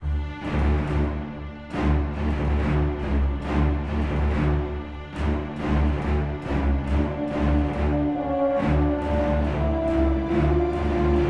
(No Rock Drums and No Choir); J Adamich; Shockwave Sound - Fantasy Worlds Vol II+III

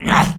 Scifi Alien Grunt Swing; Energy & Forcefields

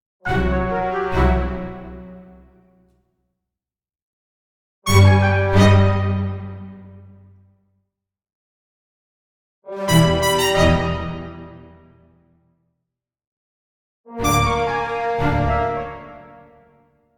Orchestral Jingle Negative

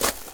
gravel; firefight

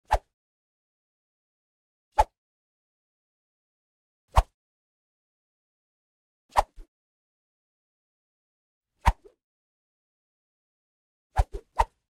Swish (Med Light); Hollywood Edge; Martial Arts and Human Impacts

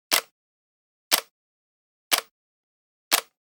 Generic Button Metal Medium; boom library; The Interface

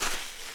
sand; firefight

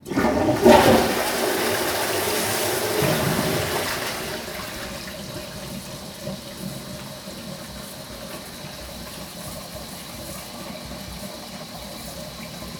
toilet flush1; NS Studios - ultra sound collection

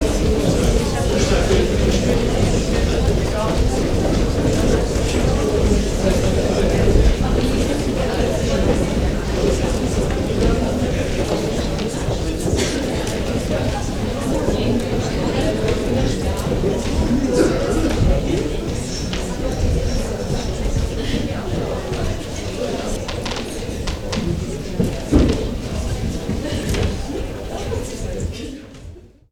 crowd leaves room, crowds interior; best service Blue Box

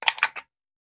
Machine Gun Foley; Sound Ideas; Guns

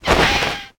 Circus Tent Deflate; SFX Kit; Foley - Misc

